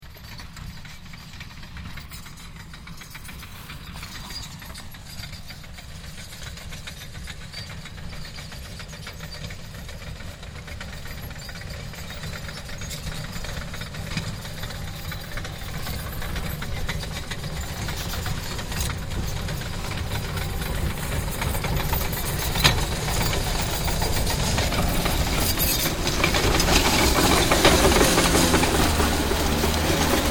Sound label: Tractor Catapillar Tracked Mount Fuji Approaches Maneauvers; Vehicles